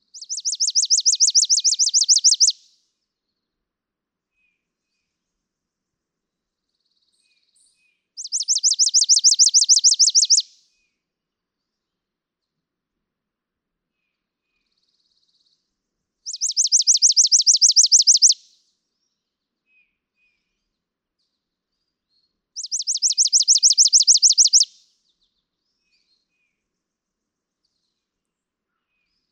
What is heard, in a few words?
Chipping Sparrow